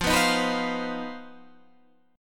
C Minor Major 7th Double Flat 5th F, chord

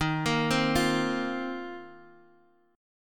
A sharp Suspended 2nd Suspended 4th D sharp

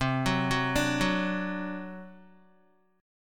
C Suspended 2nd Flat 5th C down Guitar; Csus2b5 arpeggiated

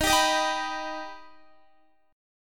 D sharp Augmented D sharp Guitar; D#+ strummed

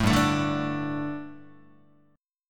G Suspended 2nd G, down, Guitar; Gsus2 strummed; JGuitar

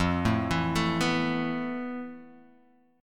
F Major Sharp 11th, Guitar Open G; 3,2,2,2,0,x; down; chord shape

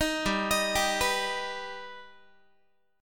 G Augmented, Guitar; arpeggiated; 18,15,18,17,15,x; 4A,4E,5A,5D,5Gsharp,6Csharp

